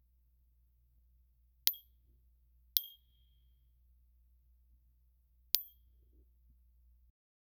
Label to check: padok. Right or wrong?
Wrong